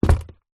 foley footstep single shoe business leather buckle zipper womans on wood floor